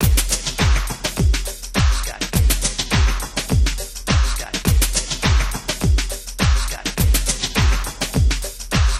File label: Music loop